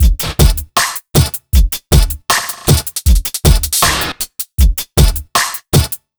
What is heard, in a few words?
BT; Ragga & Dub Reggae; USB Soundscan vol.27; AKAI